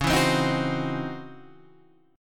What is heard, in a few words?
C sharp Minor Major 11th C sharp Guitar Standard; C#mM11 strummed; 9,7,10,8,7,8; JGuitar